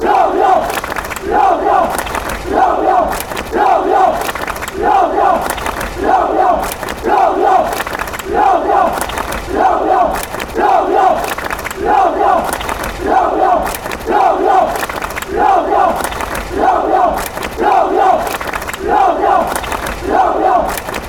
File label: fan chants; lorient